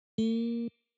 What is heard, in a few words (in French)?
Bend 1 demi ton; Cours les effets de jeu